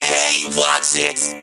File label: mech hurt